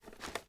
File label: reload empty end; gmod; viper; weapons